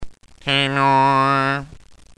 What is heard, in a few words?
tenor